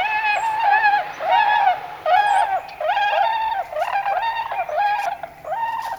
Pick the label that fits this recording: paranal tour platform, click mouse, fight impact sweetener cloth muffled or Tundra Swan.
Tundra Swan